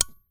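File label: foot pin click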